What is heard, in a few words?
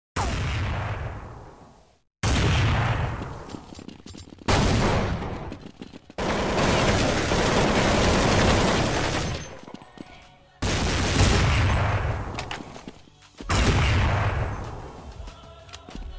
Terrorist win; Viennese terrorist